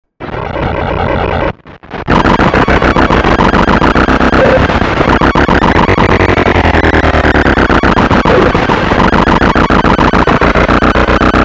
Casio SK1, circuitbending; Gijs Gieskes

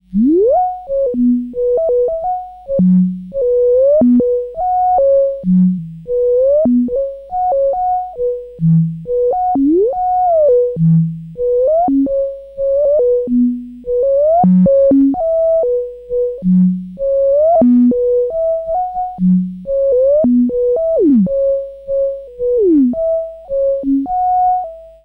casio sk1, circuitbending

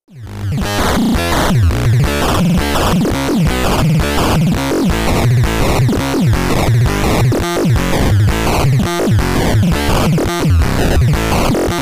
hss Metal

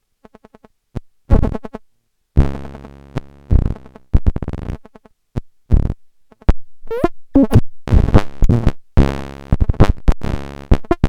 no drums, eurorack

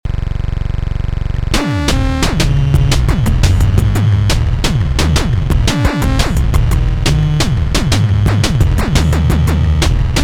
audio in 808, hard soft synth

